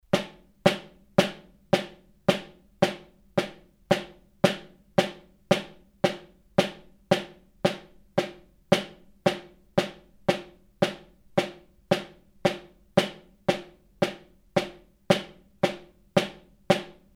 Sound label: snare